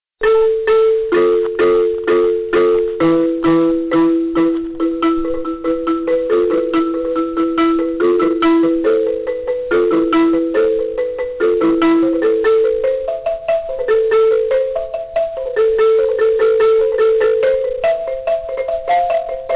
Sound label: Duyog Xylophone